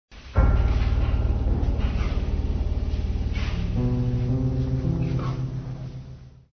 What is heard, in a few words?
dramatic